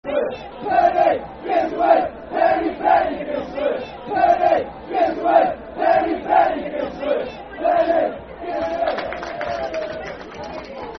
Penney Give Us A Wave, Football Chant (Soccer Songs); Darlington Dfc